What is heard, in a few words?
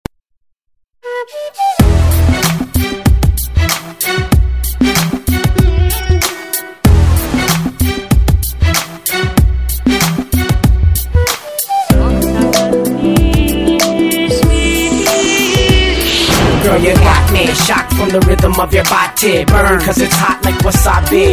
Rythm Pure